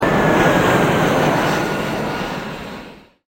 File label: jet